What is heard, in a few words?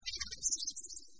mouse squeak; Delicious Emilys True Love